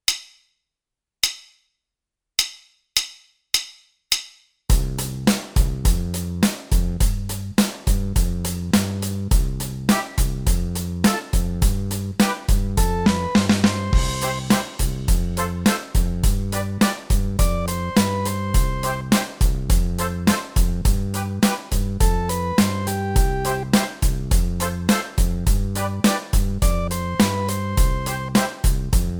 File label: Jurassic Rock Track